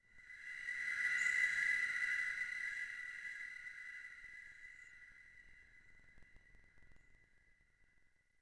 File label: Choir Pad; Ambient; Sid Meier's Civilization 4 Complete; Beyond the Sword